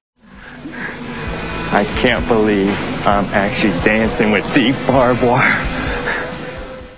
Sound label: barb dance